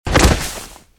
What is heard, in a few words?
grass fall; firefight